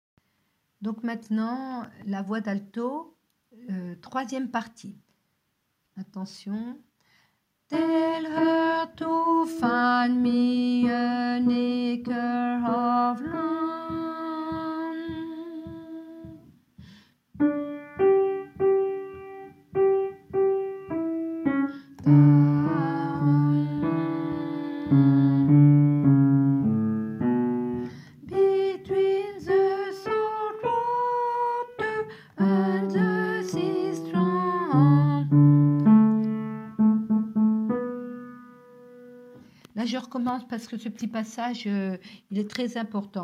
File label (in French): alti partie